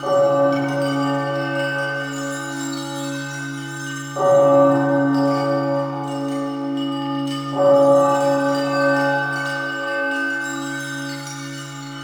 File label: Graduation Bells Loop; Tau; Itzam; Flower Farm